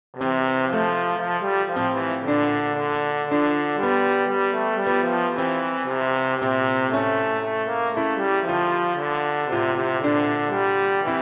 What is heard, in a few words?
We wish you a Merry christmas